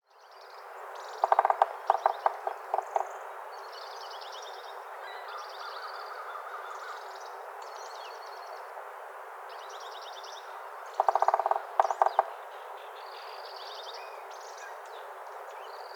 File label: Yellow-bellied Sapsucker Drumming 5 times